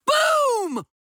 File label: boom